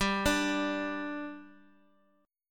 G 5th G, chord

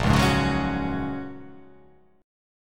A sharp Minor 7th Sharp 5th, down

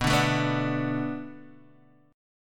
B Minor 7th B Guitar DADGAD, chord

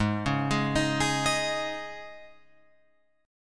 Ab Suspended 4th, Guitar Open F; Absus4 arpeggiated; JGuitar

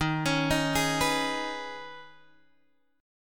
Eb 7th Suspended 4th Sharp 5th Eb, Guitar Open G; down

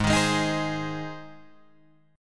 G sharp Major G sharp Guitar Drop D, chord

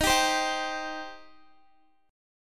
Eb 6th Eb, Guitar; strummed; x,18,19,17,19,x

